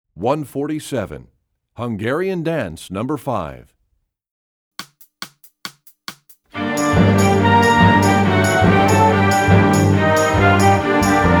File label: Hungarian Dance; EE2000 Book2